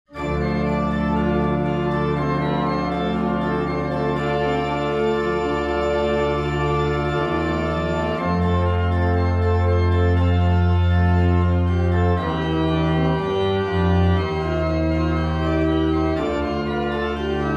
22edo Porcupine Chorale With Prelude; Nick Vuci

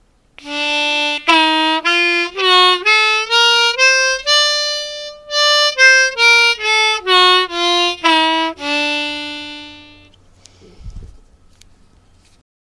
Harmonica Saba

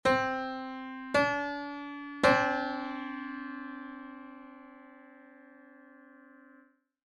Piano 15edo

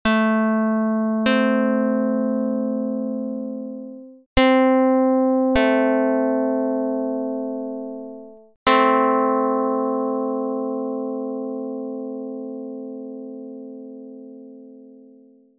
Jid 19/16 pluck dr220

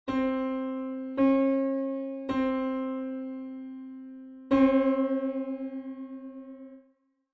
1 step 17edo

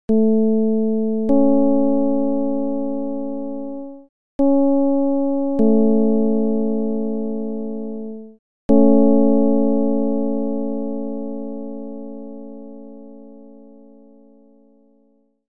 csound foscil 220hz

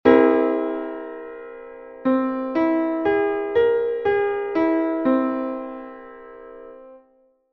Caug7 (19-EDO)

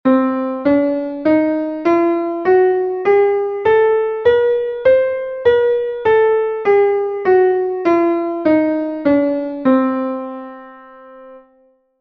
8edo scale

